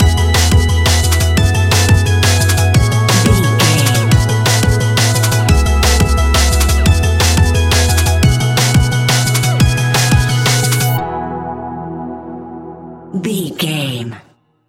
jungle cypher, A cool piece of electronic music perfect for the nightclub